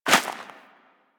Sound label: Rodent Hop Step Rear Dirt; Characters; Foley; Warframe